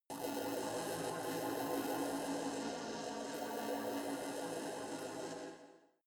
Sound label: space inside biomedical alien shuttle buzzing energy